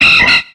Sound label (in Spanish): Grito de Swellow